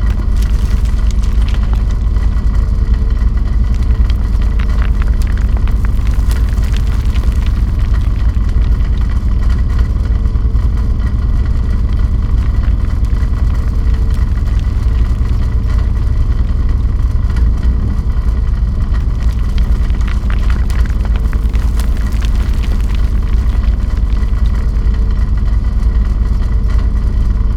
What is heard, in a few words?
drill loop